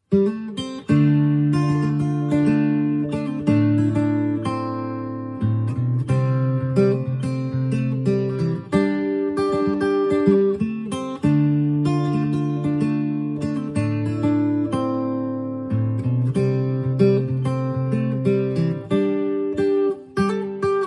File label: guitarist; midlands